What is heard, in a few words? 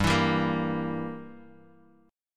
G Minor 6th, Guitar Open F; Gm6 strummed; JGuitar